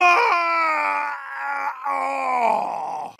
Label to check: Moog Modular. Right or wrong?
Wrong